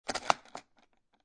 Guitar Case Open Single Buckle, Foley, Guitar Case, Opening Single Buckle; Latches; Objects